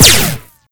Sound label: fire